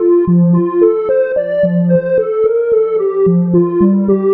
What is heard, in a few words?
Glass Bells, Trip-Hop Loops; Sonic Foundry (Sony Creative Software) - Rondo Brothers Trip-Hop Loops & Samples; Apologists